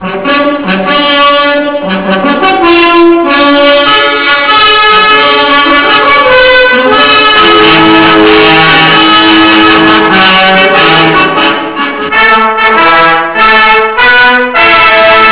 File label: fanfare; Quo Vadis